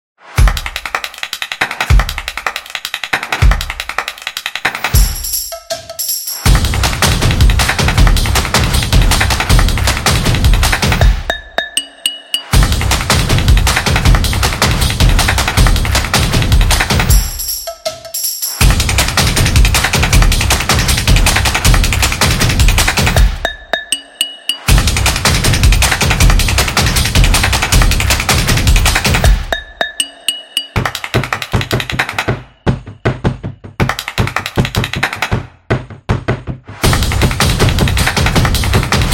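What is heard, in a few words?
Athlete, sport and action music; soccer stadium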